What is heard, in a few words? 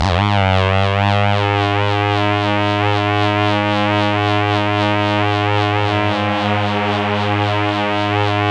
C2, Synth Line; 90 s Sample CDs; NiceBeats; SynthLine VOL-24